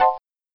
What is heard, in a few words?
mac sosumi; twitch